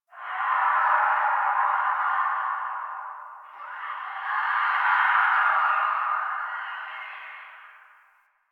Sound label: Distant Infested Screams Winter; Warframe; Ambience; Nineteen Ninety Nine